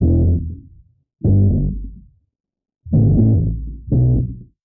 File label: bass hard rock, Loops Phonky